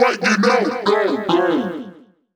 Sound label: dubstep club vox